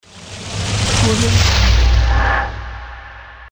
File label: exhale transition